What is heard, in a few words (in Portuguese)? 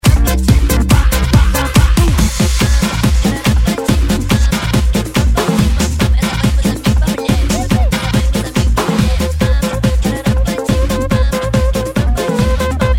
electro funk e electro dance remix